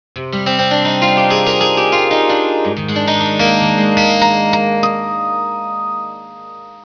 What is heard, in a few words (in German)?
gitarre; winstrom